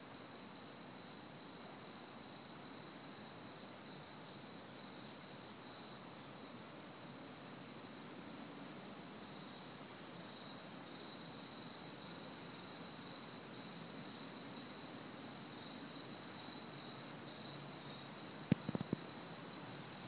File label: crickets